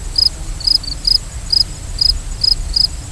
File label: grashopper; ambient; AssaultCube; Alien Stars; American Muscle Series